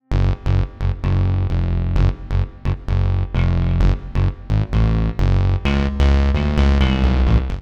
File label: blower 16 beats